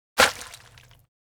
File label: impact juicy; fights bodyfalls; Asian Show Sound Effects